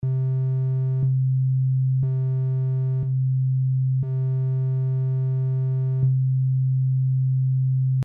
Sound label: Synth Singer Choral